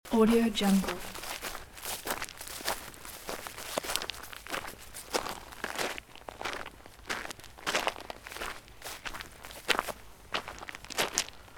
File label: footsteps on gravel path and grass loop